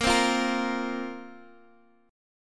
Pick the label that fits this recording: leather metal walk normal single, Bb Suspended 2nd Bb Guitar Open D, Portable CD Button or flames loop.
Bb Suspended 2nd Bb Guitar Open D